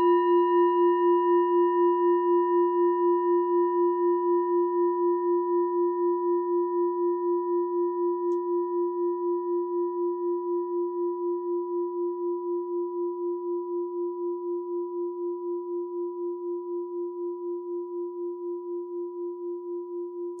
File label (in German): kleine klangschale